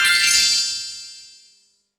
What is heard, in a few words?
success